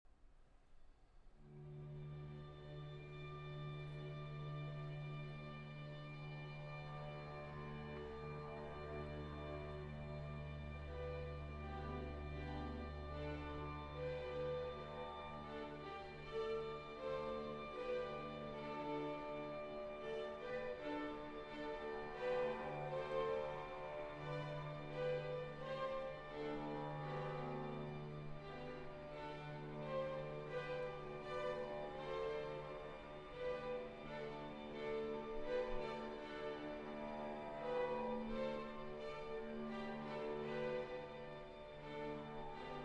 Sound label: String Orchestra; IV. Leopold Cadenza